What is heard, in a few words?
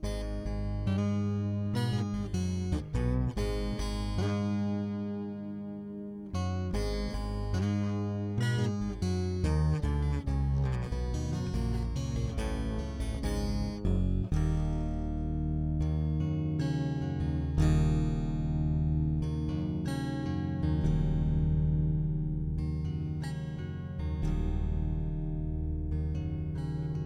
12 string 3 oct.